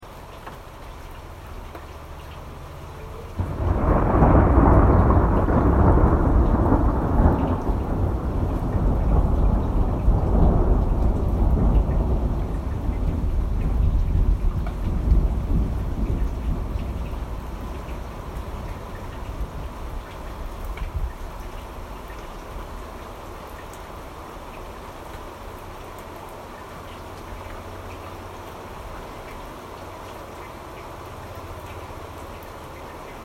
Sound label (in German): geraeusch